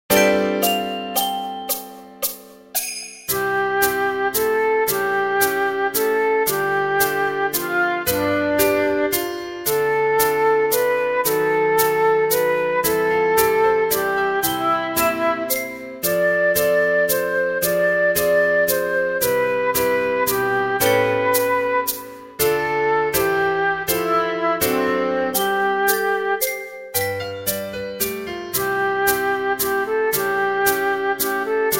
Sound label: Spirit Of Christmas ACCOMP TOP